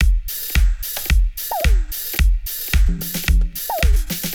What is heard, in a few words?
Disco Future 110 bpm, drums; Loops; Ableton Live 12 Lite; Core Library